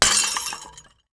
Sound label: glass breaking